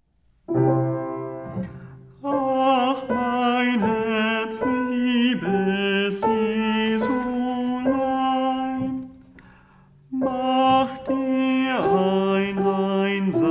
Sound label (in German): Ach mein Sopran, Bach-Choräle Sopran